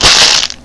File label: farming tree near; Bolo